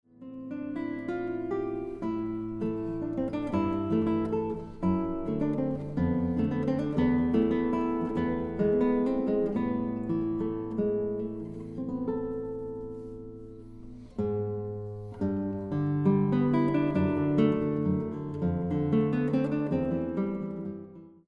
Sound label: valse, guitar